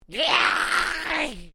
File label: Created creature small yech; Created creatures, vocalisations and pretend speech; Alien Sound Effects